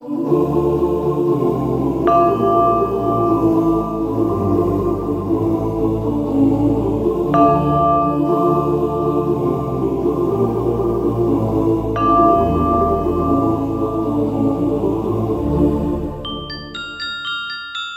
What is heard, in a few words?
voice and keys, Sad